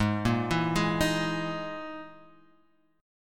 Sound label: E Major 7th Flat 5th Ab